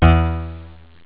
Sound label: low guit; sonix; Rise Of The Dragon; chipmusic